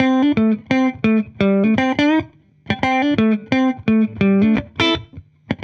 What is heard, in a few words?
70s Strat 85 A, Guitar, 85bpm; musicradar